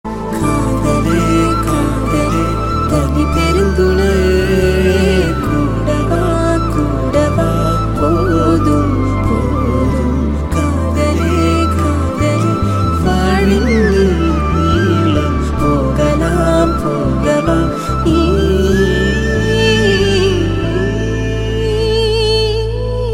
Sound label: violin themes Ringtones